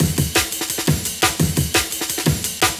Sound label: breaks; M8